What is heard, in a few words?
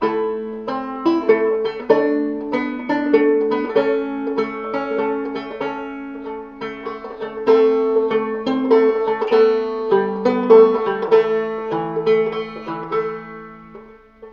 guitar, Sad